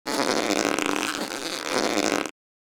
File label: Rubber stretch and stress